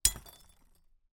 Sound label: impacts broken wine glass shard smash in cardboard box